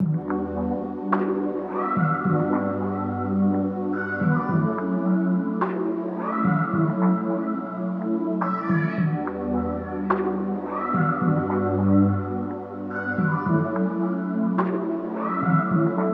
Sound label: 107 BPM B Min, Melodies RnB; Cymatics; ZODIAC Beta Pack